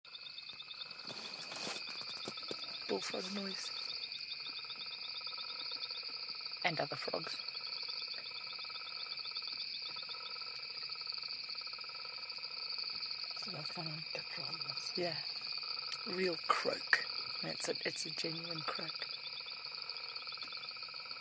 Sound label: bullfrog; frog safari